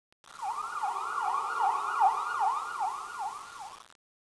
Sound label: siren; aim skytex